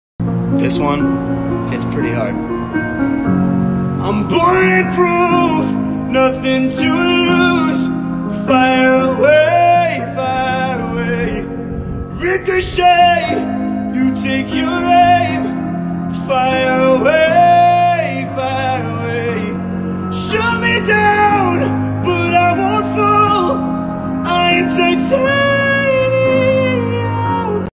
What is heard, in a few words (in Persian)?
Sedaye Mard